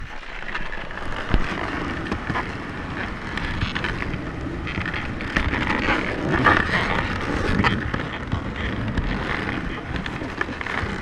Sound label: Dog Team Travelling Onboard Intense, Kamchatka Peninsula Ambiences; Alexey Sinitsyn